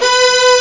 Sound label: strings; Amiga; Steel Sky; 8-bit Sampled Voice